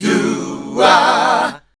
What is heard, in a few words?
Voice Spectral, H PHRS